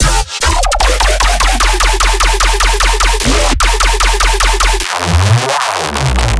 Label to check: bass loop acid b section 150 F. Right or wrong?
Right